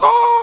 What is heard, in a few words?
SCREAM; SCALA